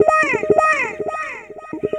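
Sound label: 120 East Coast HipHop Echo Guitar Eb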